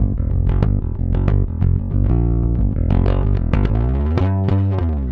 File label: Rock, Bass; Bass X